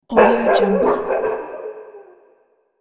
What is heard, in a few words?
asylum horror guard dogs; sonic-boom